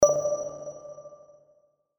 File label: another jackpot coming fx